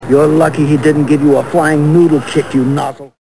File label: noodle flying